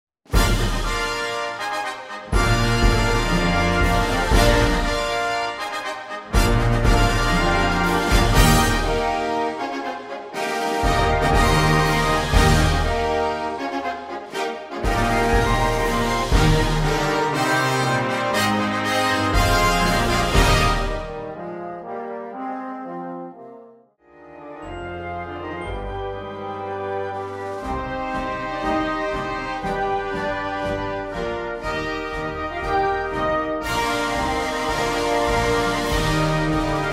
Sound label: Exuberance full band